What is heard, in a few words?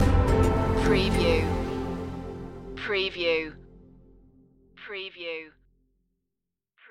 eastern promise stinger